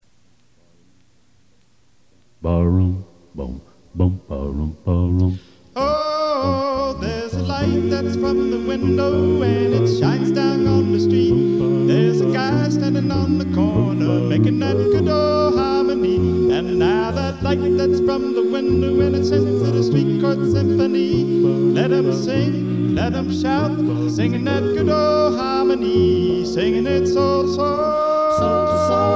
Acappella

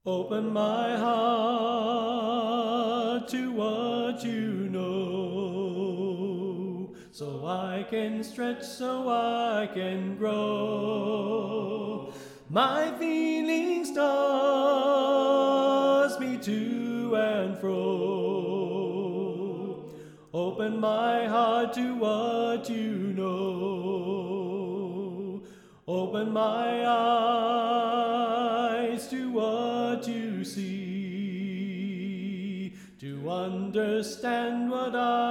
Soprano Left

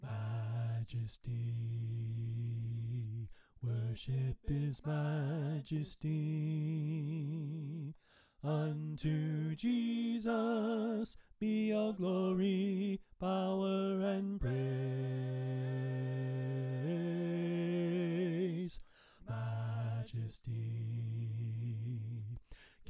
Bass Left

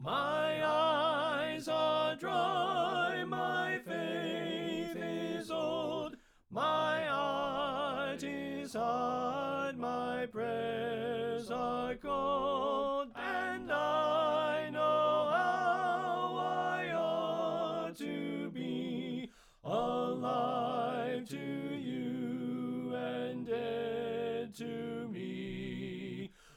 Alto Left

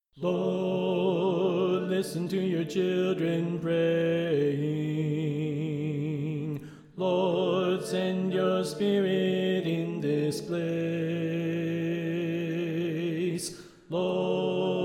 Soprano Left

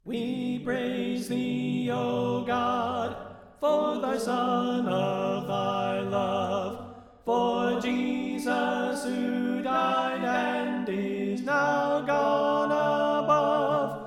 We Praise Thee O God Tenor Left

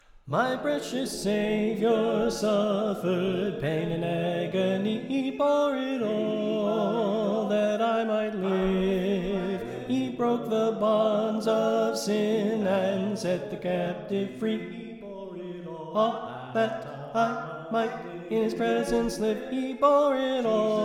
Soprano Left

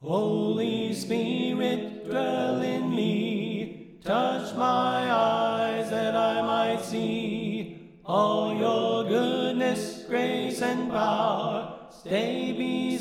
Tenor Left

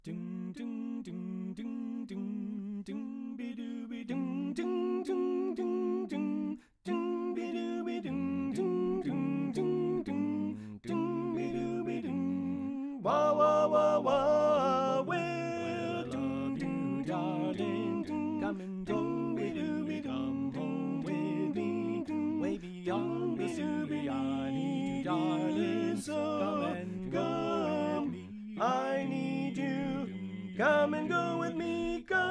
Tenor Left; Pennsylvania Groups

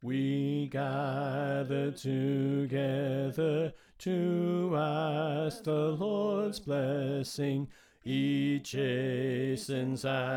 Bass Left; We Gather Together

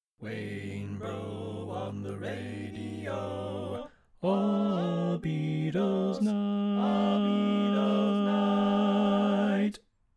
Bass Left; Pennsylvania Groups

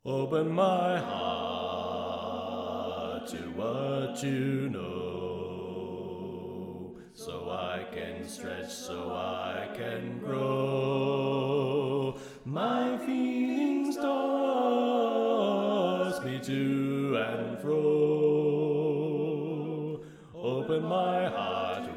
Bass Left

